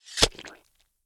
GORE Stab Bubbles; mono; GORE & SPLATS; Universal Sound FX

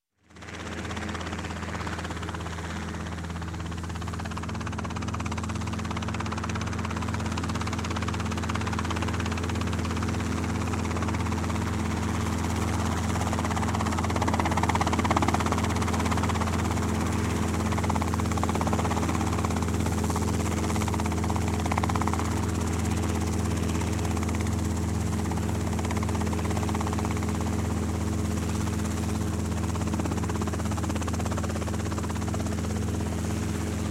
Helicopter Robinson R hovers Around And Passes; Passes Overhead; Sound Ideas 2000 Series

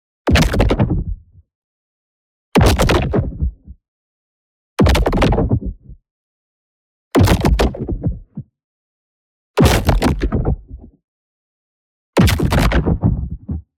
EXPLOSION LARGE Rift Shockwave; boom library; Matter

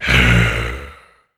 zombie aware; DeadFright

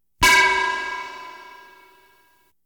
Science Fiction effects, Lasers, Light beams; Valentino Production Sound Effects Library CD50

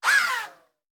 Bullet Pass By; Sound Ideas; Guns

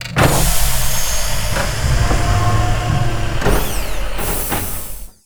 Door Large Metal Open; SFX Kit; Doors

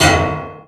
Menu Select; Menu FX

